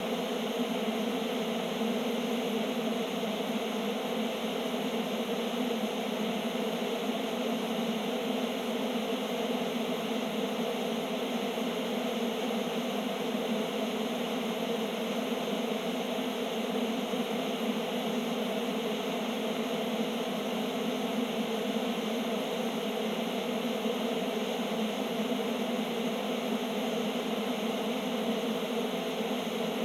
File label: speaker reference noise; amt; hauth2020